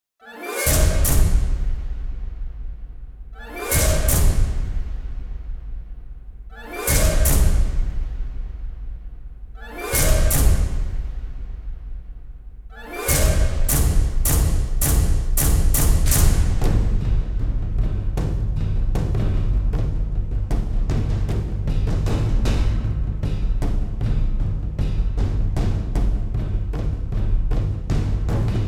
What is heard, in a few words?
Percussion; ProScores